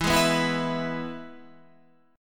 E-Major E, Guitar Standard; strummed; JGuitar

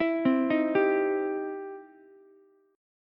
E Minor Major 7th Sharp 5th, E, down, Ukulele; EmM7#5 arpeggiated

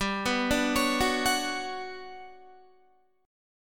G Major Sharp 11th, Guitar; Listen to GM#11 arpeggiated; GM#11 Chord

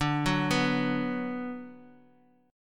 D 6th D down, chord; D6 arpeggiated; JGuitar

